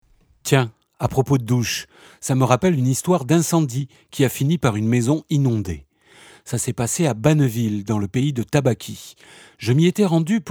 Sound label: transition